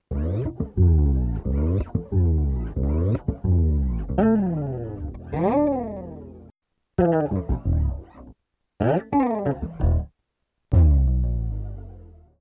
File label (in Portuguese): Baixo Vaneira Loops